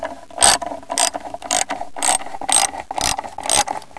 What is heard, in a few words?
chains on